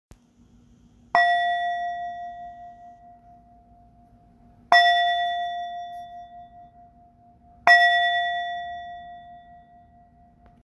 chakra F striking; Silver Tibetan Meditation Singing Bowl – 5″D

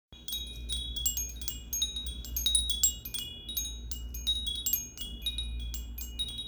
leaf

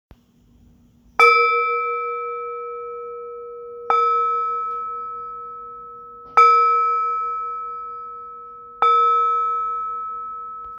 Yoga A striking

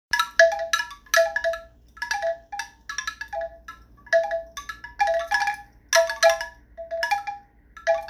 chakra bamboo

